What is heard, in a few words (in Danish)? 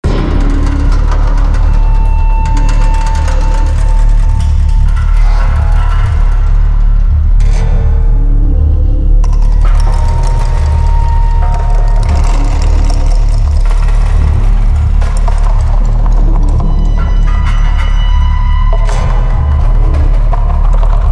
drone lyddesign